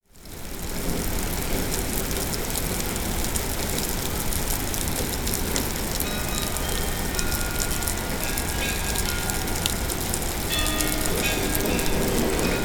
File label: trinity sergius monastery rain; sergiyev posad